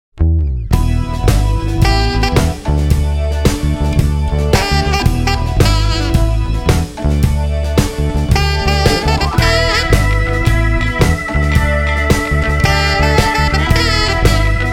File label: Late Nite; Soundzabound Volume 3